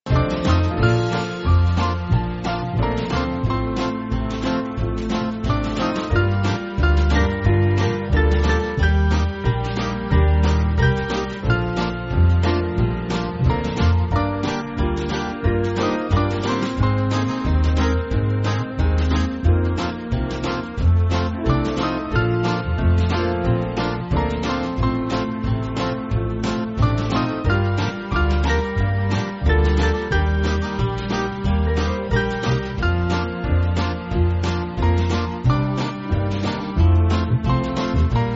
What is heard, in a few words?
Band